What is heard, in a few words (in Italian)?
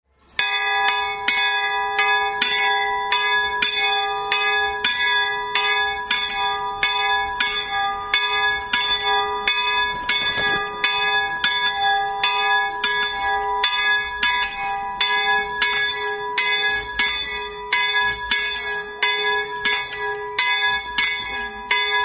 campane; leproso